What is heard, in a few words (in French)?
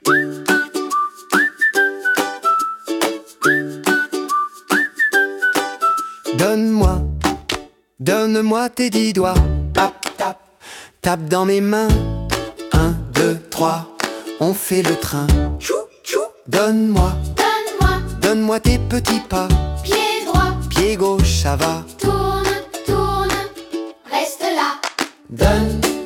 donne moi tes dix doigts, comptine rythmique